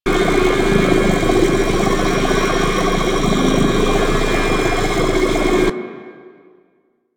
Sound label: Regeneration Loop; Warframe; Warframes; Paladin